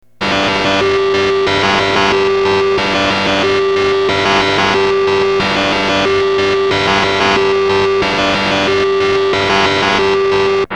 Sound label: noise ufo